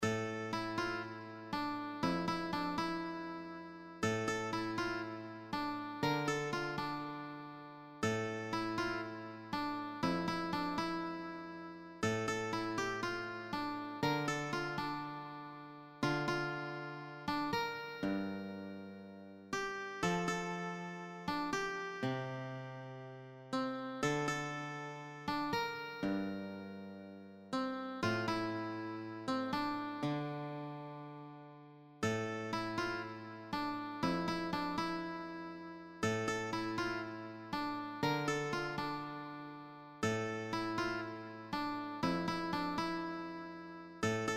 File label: Lullaby